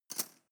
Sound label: cutlery teaspoon drawer place soft